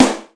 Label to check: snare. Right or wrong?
Right